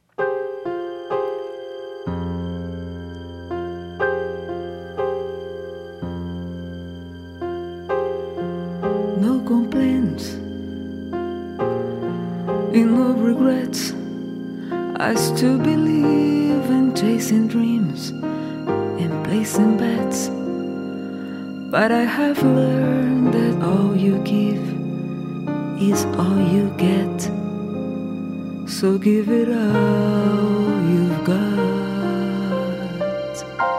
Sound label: Portuguese English F Singing; voice over